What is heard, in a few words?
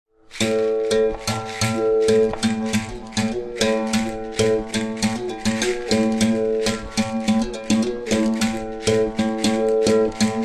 Berimbao